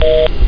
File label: Phone Busy Loop; Mechanical